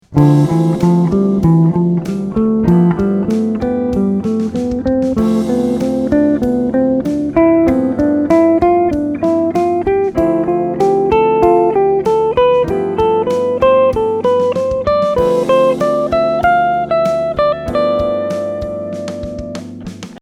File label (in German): D Dorisch auf ab mit Playback 4 er Sequenz